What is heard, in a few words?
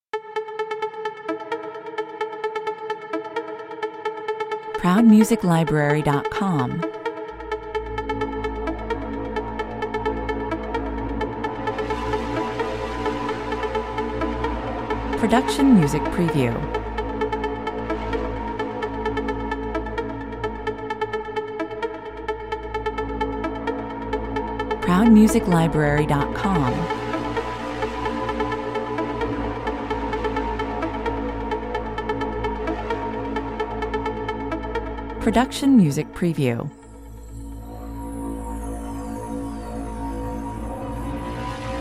mystic intro 130 bpm